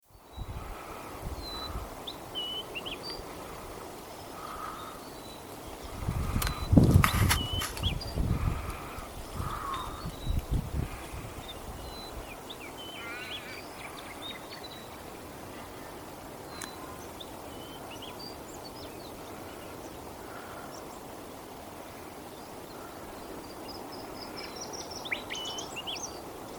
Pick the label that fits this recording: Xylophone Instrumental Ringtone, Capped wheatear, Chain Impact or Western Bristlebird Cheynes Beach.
Western Bristlebird Cheynes Beach